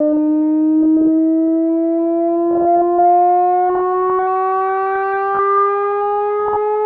SNTH BASS TEKNO 140 A

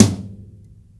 tom mi, acoustic drum

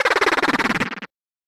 Industrial 140

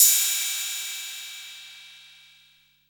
808 CYM, TR-808

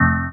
C Synths, One Shots; Keys & Synths; Touch Loops; BlissedOutBeats

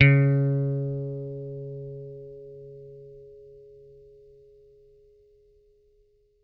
GOOD 80 PICK C#3, bass; Bass Mace; DirectWave

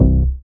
BASS STER.

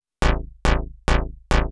TECHNO BASS 140 A

